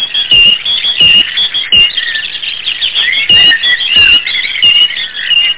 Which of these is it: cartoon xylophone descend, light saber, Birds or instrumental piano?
Birds